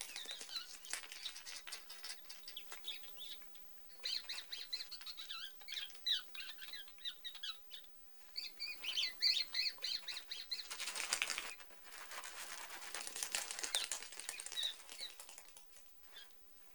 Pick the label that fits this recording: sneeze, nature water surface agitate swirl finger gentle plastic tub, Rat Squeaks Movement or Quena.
Rat Squeaks Movement